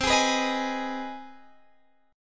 C Minor Major 7th Sharp 5th, Guitar, Half Step Down